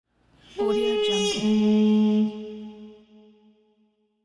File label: funny cartoon trumpet elephant